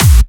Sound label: Clubby Kicks, Bassdrums